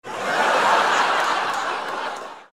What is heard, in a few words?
laugh